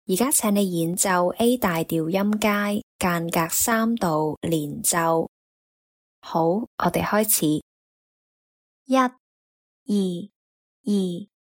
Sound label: a maj scale 3rd apart legato